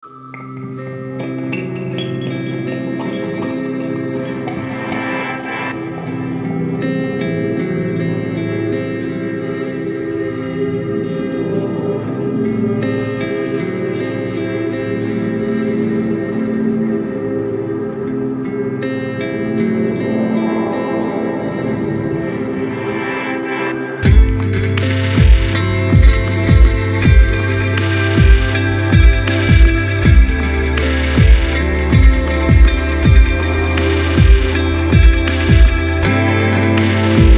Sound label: Soundscape Guitars